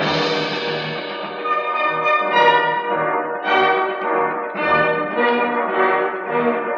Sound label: strings march, Sad Loops